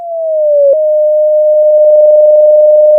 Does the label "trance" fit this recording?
No